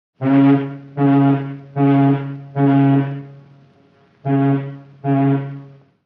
Ship Horn Shrt Blast

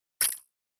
Button HF Tension Wir

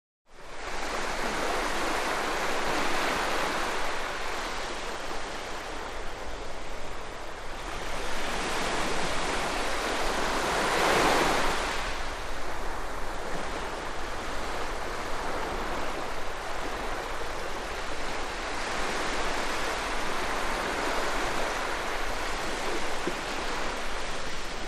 Waves Closeup To Medi

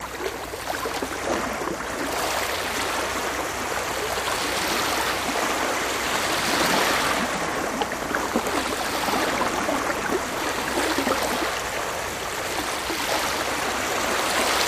Ocean Water Active La

